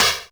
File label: snaredrum, Yamaha RM 50